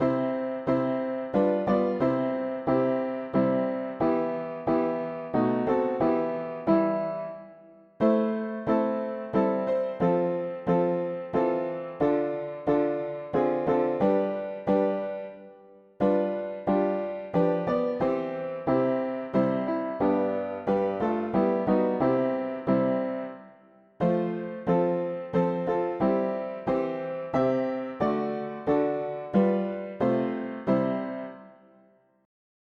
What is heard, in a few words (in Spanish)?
mono piano; hymns; Himnario pequeno